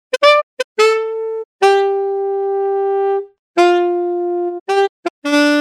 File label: sax